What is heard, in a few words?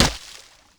water run shallow